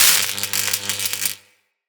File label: UI Crafting Spark